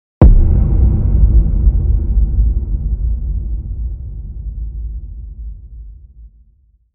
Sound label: Cinematic Punch